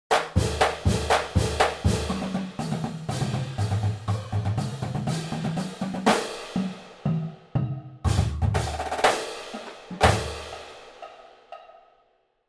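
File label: house battery template, drum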